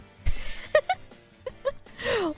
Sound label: laugh